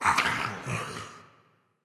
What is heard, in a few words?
Grim Cemetery Summon Moan; Fallen Ones